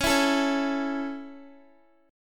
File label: Db Minor Sharp 5th Db Guitar; strummed